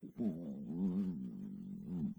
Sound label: Tummy grumble